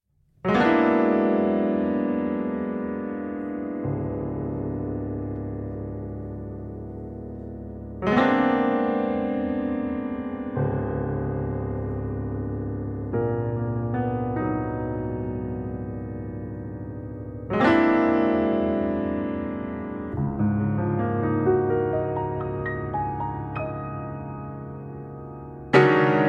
Piano Reflections

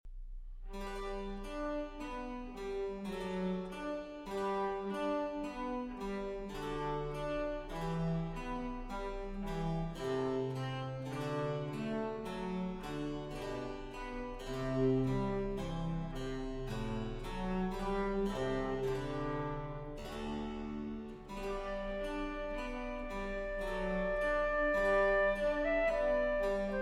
Flute Sonata; Flute Sonata in E Minor; III. Andante

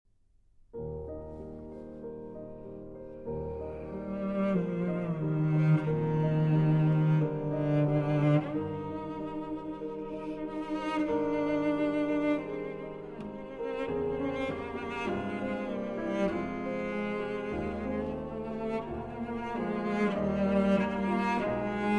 Cello and Piano; There's Nae Lark

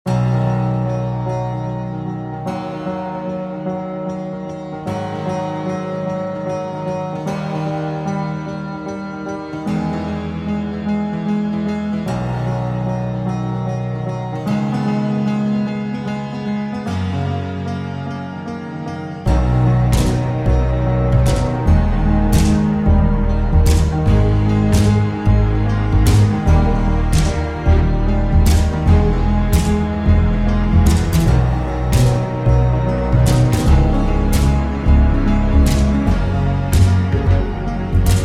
Instrumental

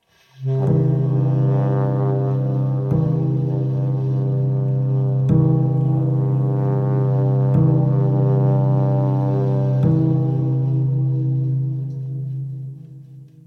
C Minor Prelude

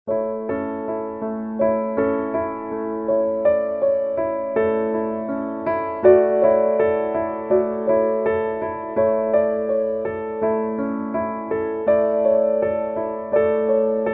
Classical Piano

